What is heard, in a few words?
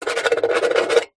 office pen biro writing sign signature metal box